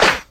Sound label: original clap sample a key; single hits one shots